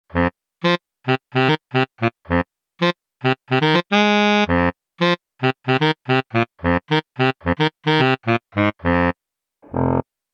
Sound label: Casio SA 80; 59 Wood